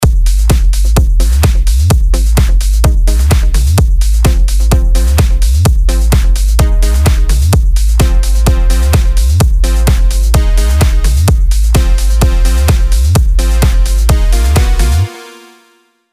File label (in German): dub, die perfekte Bassline